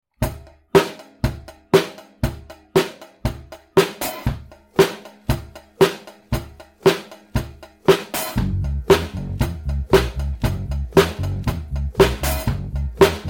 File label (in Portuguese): intro contrabaixo e bateria